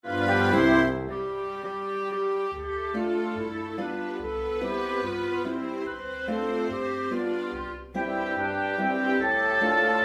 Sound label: karaoke